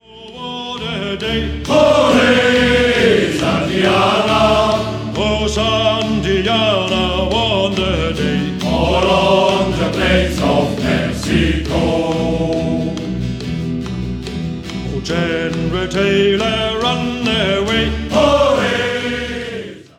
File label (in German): Shanty Chor